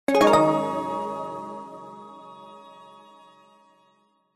multimedia game sound modern warm family game positive strum chime